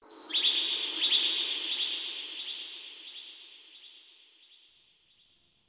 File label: Forest Distant Bird; Warframe; Grineer Forest